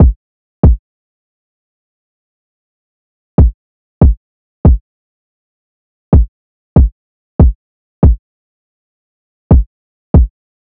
Drum Loop - 142 BPM Kick, Drill; Loop Stems; Cymatics; PARADOX II - Drum Loop Collection; Yearbook

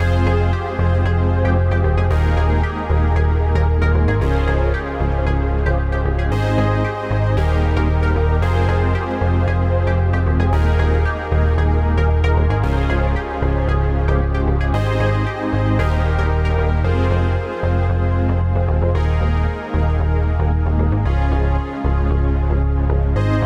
114 BPM F Min, Melody Loops, Retro Collection

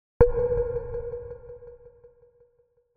Tone

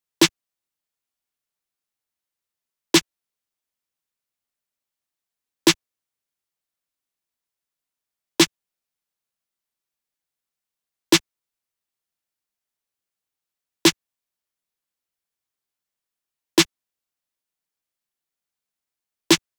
Drum Loop - 88 BPM Snare; Drum Loops; Loop Stems; Cymatics; Mystery Pack Vol 4; Patience Drum Loop

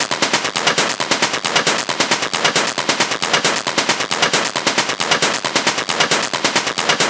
Perc Loop 135, Techno

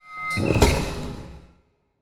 Large Gate Close; Game Essentials

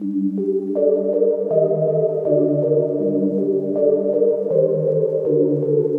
Dark Melody Loop 160 BPM G Min; Cymatics; Oracle Sample Pack

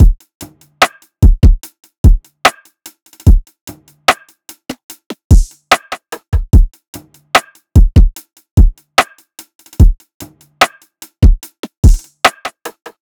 Drum Loop 147 BPM; Sparkles; Cymatics; Vibes II Drum Loop Collection

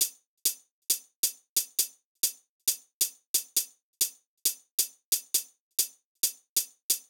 Drum Loop 135 BPM Hihat, Hip Hop; Cymatics; She Goes

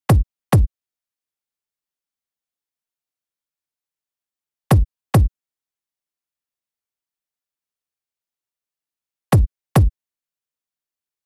Drum Loop 104 BPM Percussion, Midtempo; Blossom; Cymatics; ANALOG EVOLUTION Beta

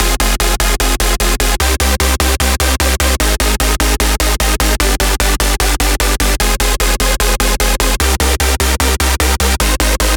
Dubstep Chord Loop 150 BPM F Min; Chord Loops; Synths; Cymatics; Dubstep Starter Pack

